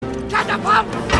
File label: catapult